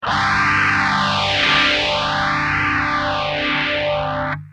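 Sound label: guitar Systech phaser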